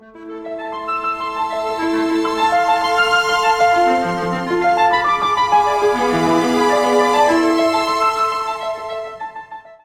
vertu signature ringtone

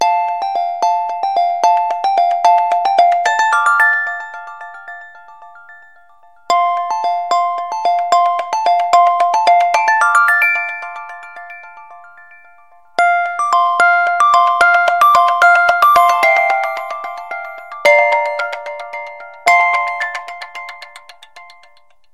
samsung s8 simple ringtone

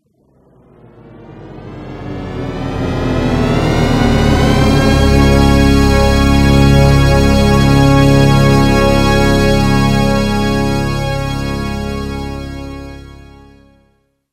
sms start movie ringtone

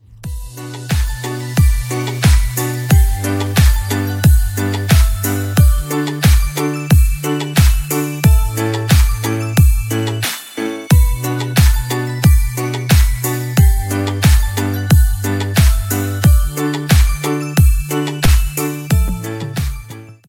remix ringtone

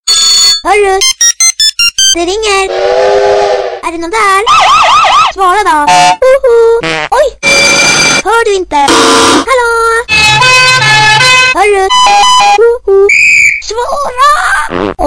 funny ring tring ringtone

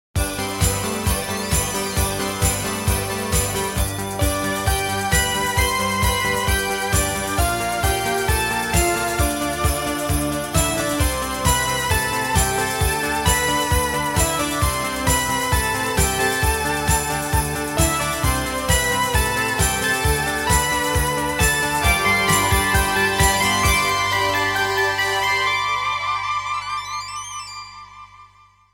xmas jingle ringtone

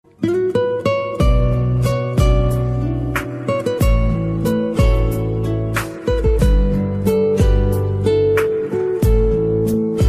nice ringtone

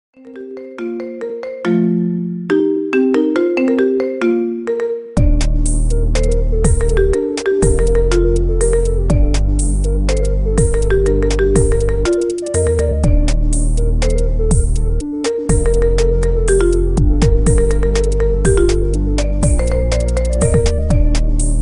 2 phones marimba ringtone

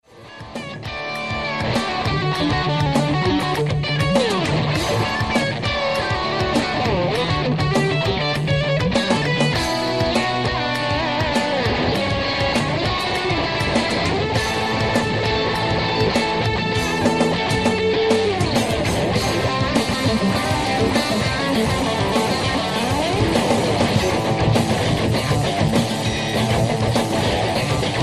best guitar solo ringtone

